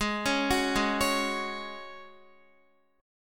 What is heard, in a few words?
Ab 7th Suspended 4th Ab down Guitar